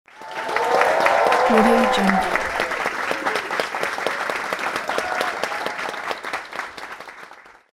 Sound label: cheering applause